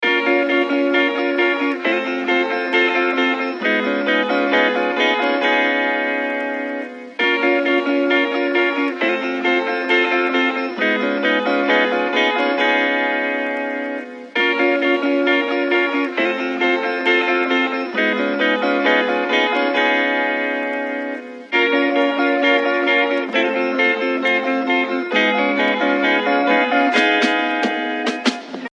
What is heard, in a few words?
134 Bbm; CRATES & BREAKS VOL.1 - BEEJ